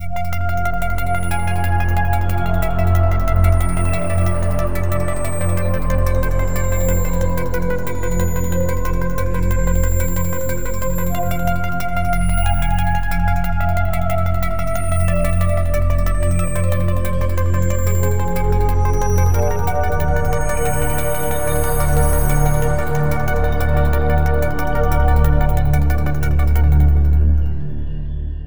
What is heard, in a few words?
Eerie Cyber World, sci fi